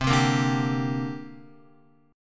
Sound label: C 7th Suspended 2nd, Guitar; C7sus2 strummed; JGuitar